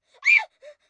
Scream Surprised, Scream, 1 child, short, yelp, human voice, kid; Screaming